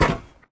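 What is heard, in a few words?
Grunt Movement Footstep Walk Metal Grate; Titanfall 2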